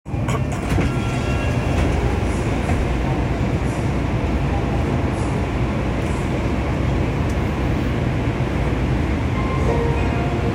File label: train sounds; 日比谷線小伝馬町北